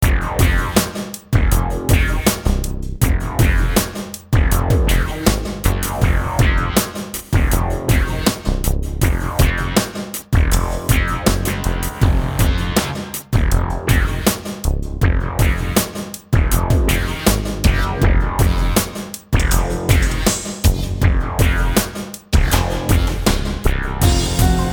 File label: predelay 180ms, reverberation acoustique